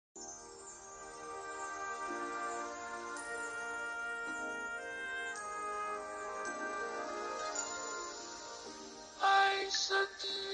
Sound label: drowning